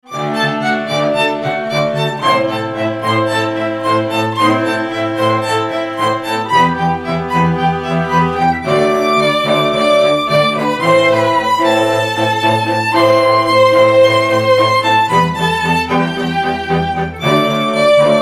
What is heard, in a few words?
Clocks